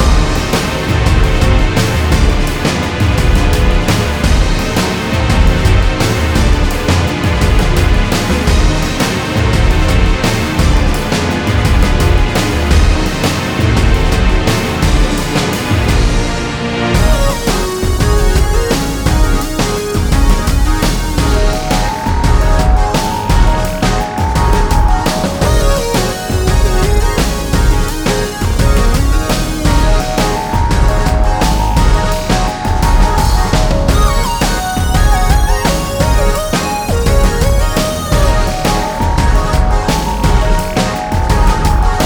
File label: BGM MIDDLE BOSS